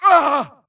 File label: grunt death quick; Titanfall 2